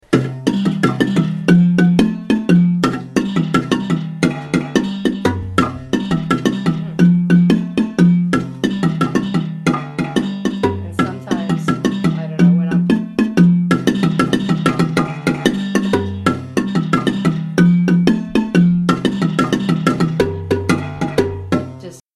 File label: bar middle, marimba